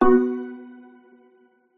multimedia alert single note mallet synth exclamation; zapsplat